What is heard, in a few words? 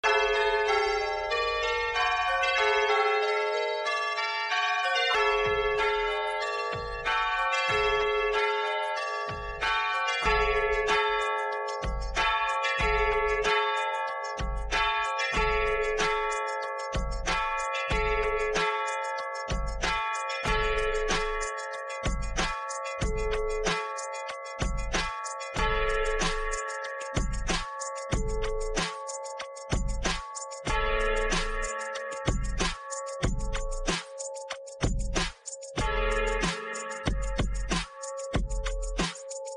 church bells to electronic beats; riffusion; stable diffusion